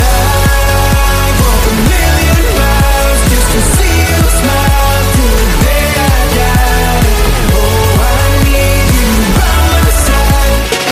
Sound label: hot electro ringtone